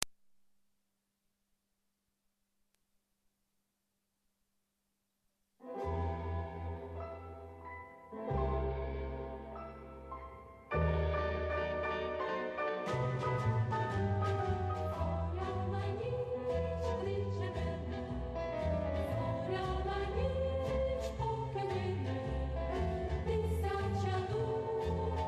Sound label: Ukrainian tango; Kos Anatolskyi